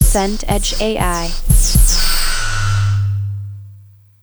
laser discharge

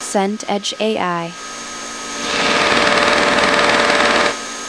projector fan

